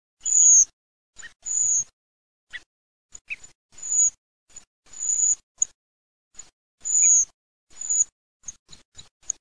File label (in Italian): SASSELLO